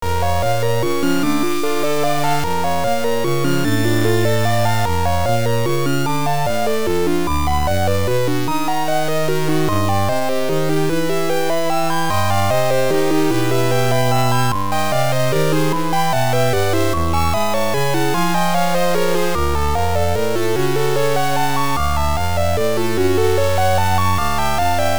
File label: Dungeon; Lodoss Tou Senki 2; PC Engine Super CD-ROM2